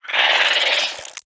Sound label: Venomous Scorpid Attack